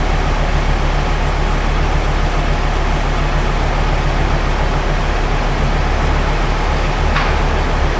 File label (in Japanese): volvo v70, ボルボ直列5気筒の排気音; 20バルブ）［ライトプレッシャーターボ付］ 総排気量：2,434c.c. 最高出力：193ps/5,100rpm(DIN) 最大トルク：27.5kgm/1,800-5,000rpm(DIN); 44.1kHz / Stereo / 8sec